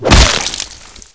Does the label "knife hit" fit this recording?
Yes